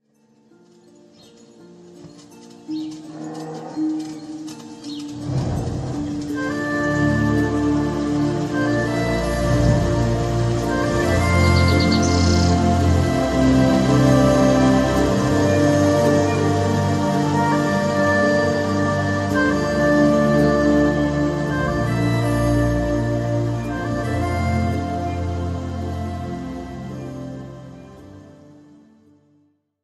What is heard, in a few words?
flute bgm; krishna flute bgm; karthikeya 2